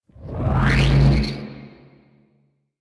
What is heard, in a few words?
Impact Warning; Warframe; minigames; Caliber Chicks minigame; boss